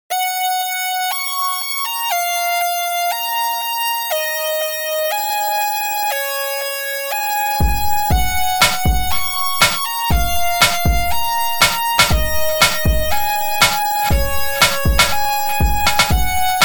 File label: smarty party; vintage collection vgm sfx tracks stuff vol ii